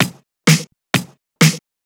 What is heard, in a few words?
Straight Loop 128 BPM, House